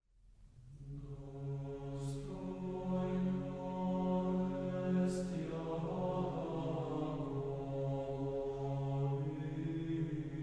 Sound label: boys choir; Sounds Colours; Достойно ест, Гр. Първопеец; Sofia Boys' Choir